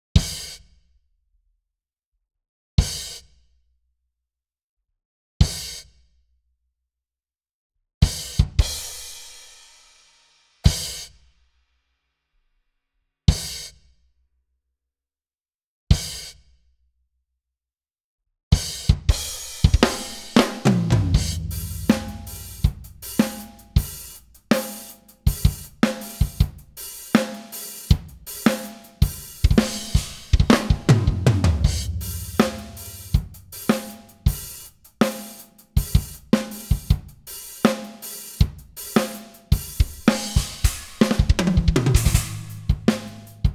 Drums I

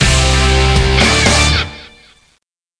Music Unlock; Learn to Fly 3

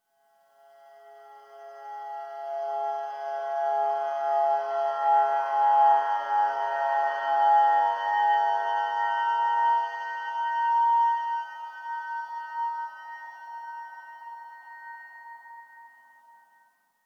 Water Girl Above Water Horror; inside

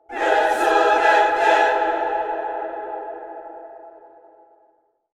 Minigame Success; Stacey

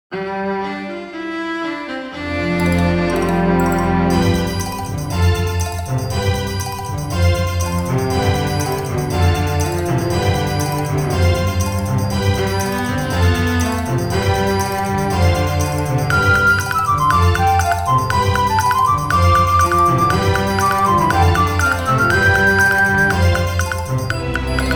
Cello; dot hack Link

